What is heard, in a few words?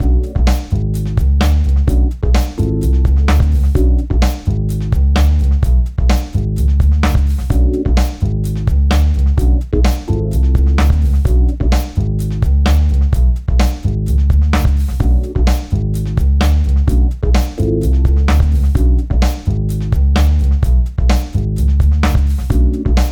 Ingame Uptempo; Ms. Pac-Man Quest for the Golden Maze